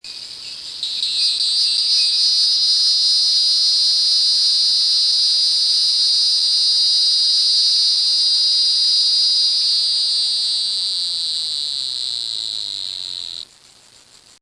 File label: cicada; NA species